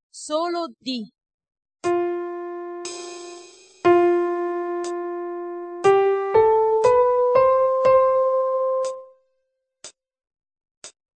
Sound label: 4/4 solo